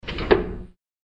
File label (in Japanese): 仕掛け作動; ボタン・システム; システム音